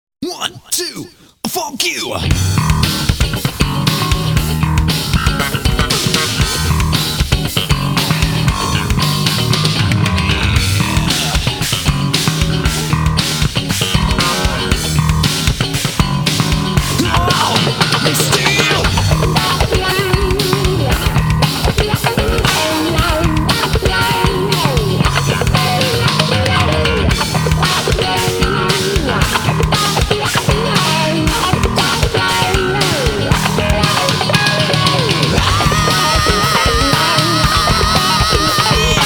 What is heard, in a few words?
funk instrumental